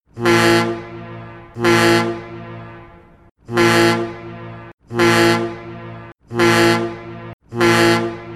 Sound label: fog horn train